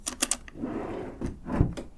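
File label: hotel drawer close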